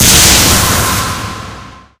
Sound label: spell lightning ball cast; halloween; video games; source engine; tf